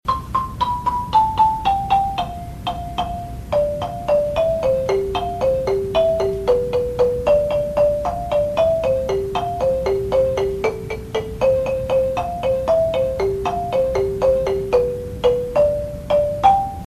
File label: lead main var, marimba